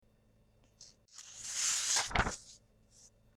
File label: page turn